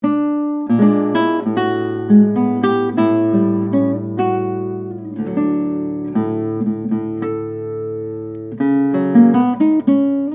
unibody, classical guitar; ennis anthony m; nylon string guitars

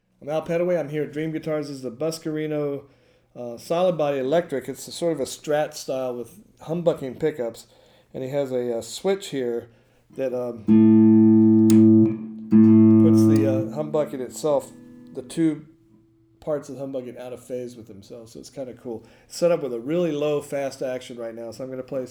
electric guitars, solid body; buscarino juk; strat style

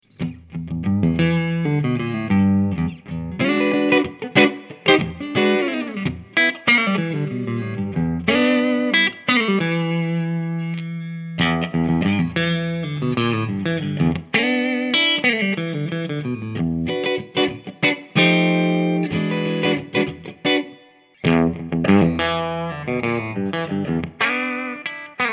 Spalt, electric guitars; spalt instruments; the nouveau series lautrec chocolat

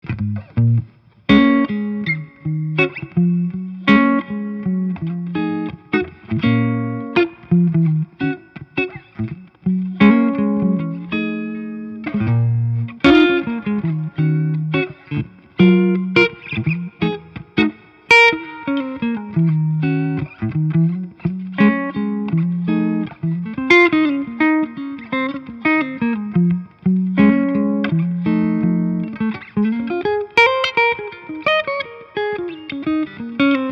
archtop guitars, electric; ribbecke halfling; mahogany spruce

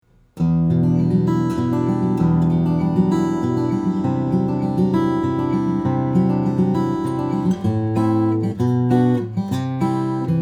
larrivee c-10e, steel-string flattop guitar

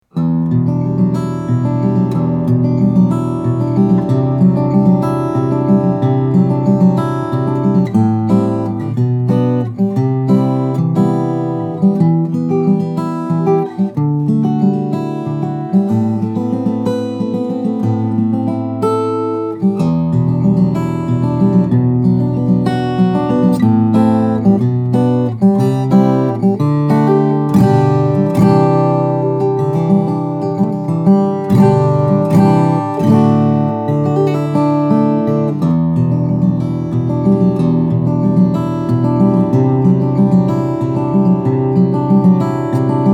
steel-string guitar, flattop; bashkin placencia om

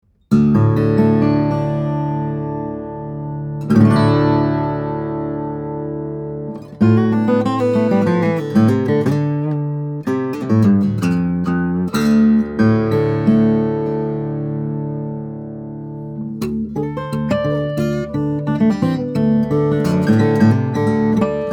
Circa OM, Madagascar Rosewood/German Spruce; flattop; Dream Guitars

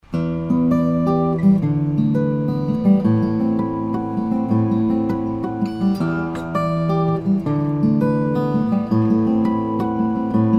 steel string guitars, flattop; crafters of tennessee tn000br 000

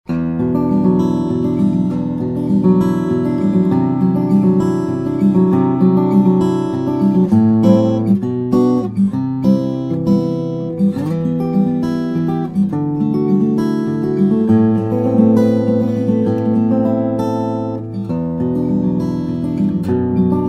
dejonge sss, steel string guitars, flattop; om

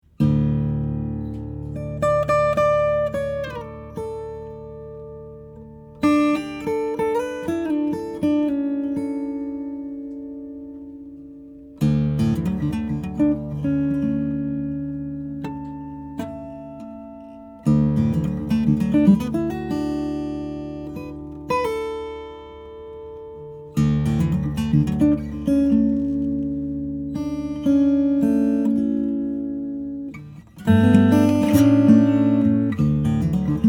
OM, steel string guitars flattop; Jang Orchestra Model, Cocobolo/Italian Alpine Spruce; A custom client commission

